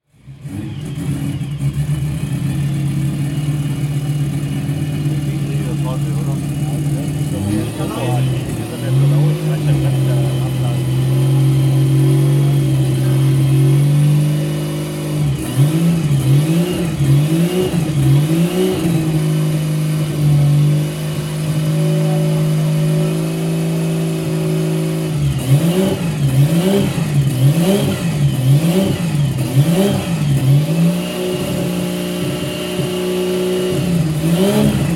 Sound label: Porsche 935 Baby; Rennlegenden erwachen und kreischen; Porsche Soundnacht 2015